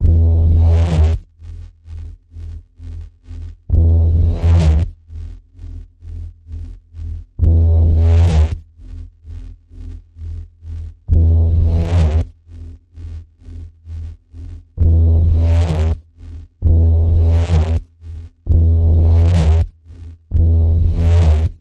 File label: Ominous Pulse E, Key of E